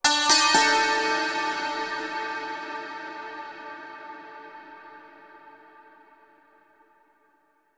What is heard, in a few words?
egyptian mystery musical accen; heroes of hammerwatch